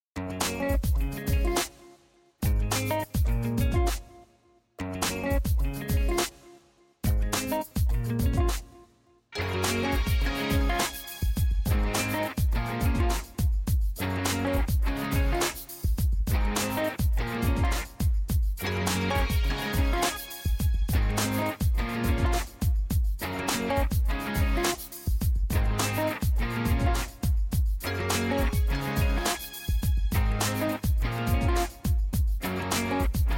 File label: music dance; stop running